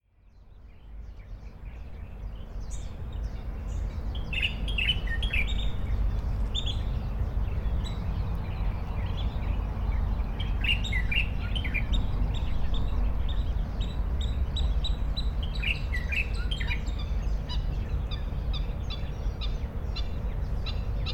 屏東崎峰濕地

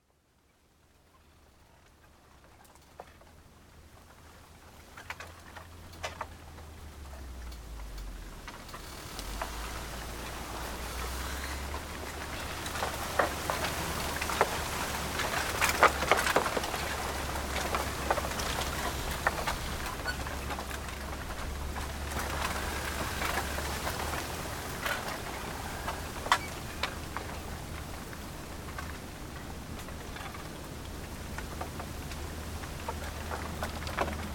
苗栗竹林

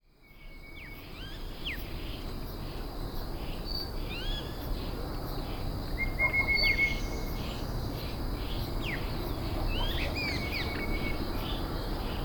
花蓮池南

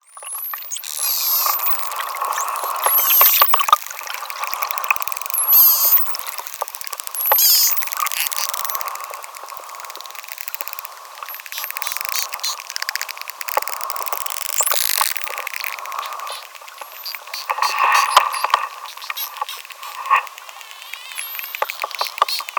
飛旋海豚

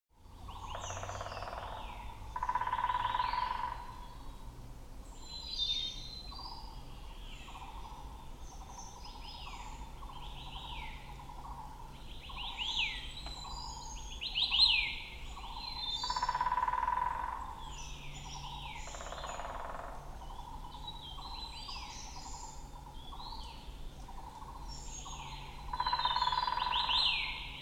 大赤啄木交響曲

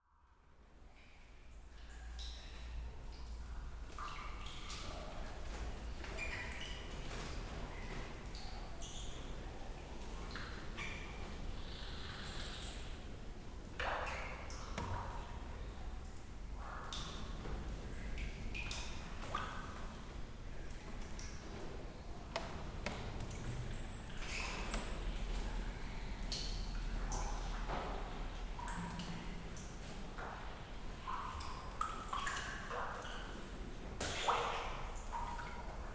月洞 蝙蝠

